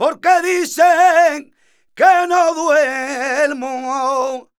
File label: voc c, Flamenco Vocals Male Cantaor